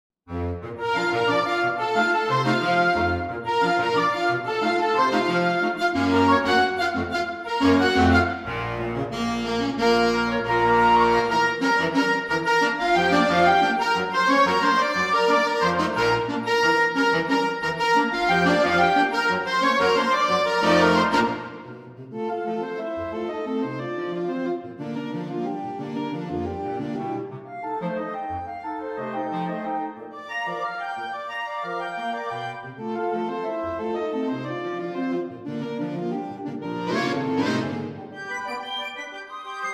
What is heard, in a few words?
Salsa